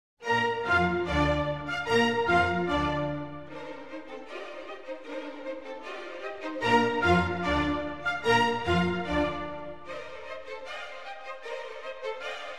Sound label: Symphony in B-Flat Major Allegro Spiritoso